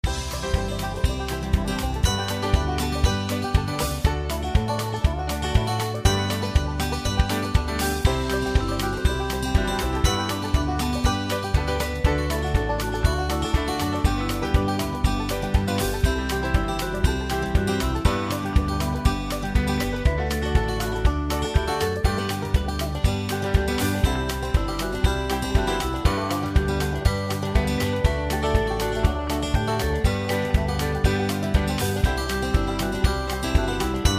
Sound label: Instrumental